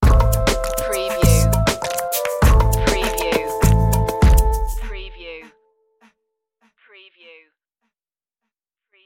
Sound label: bollywood beat sting